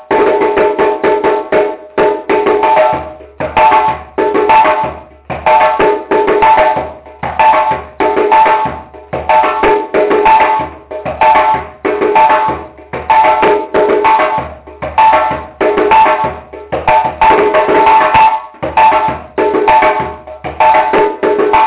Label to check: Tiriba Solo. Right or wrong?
Right